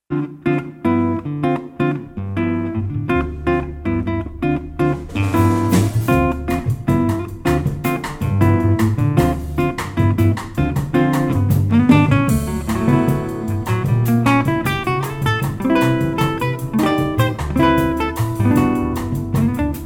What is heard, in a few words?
Wave Montage Latin Jazz; trio